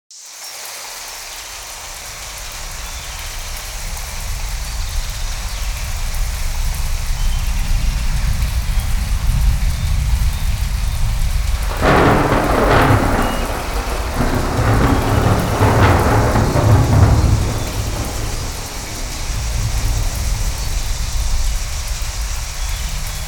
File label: rainy, music and sound; skywind